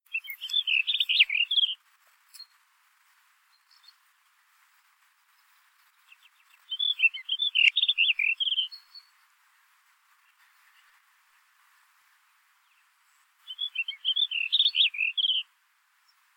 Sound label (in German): Steinroetel; Oberallgaeu; Kilian Weixler